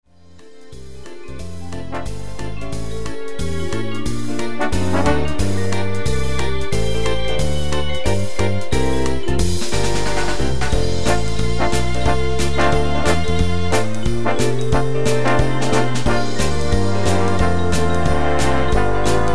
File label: Jingle bells